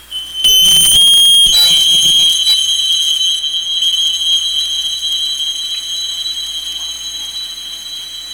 SQS26 hammering away, Skimmer SQS26 sonar pinging away; Here's what an SQS26 can sound like